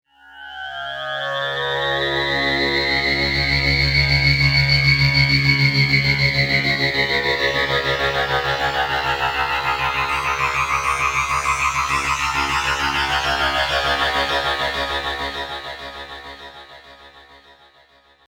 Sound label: wavetable LFO; mx4